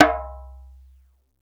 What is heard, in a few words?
DJEMBE, AFRICAN PERC; Greytsounds VOL-1